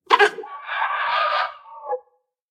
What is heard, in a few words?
vulture idle